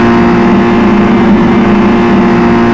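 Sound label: etypec off high,car engine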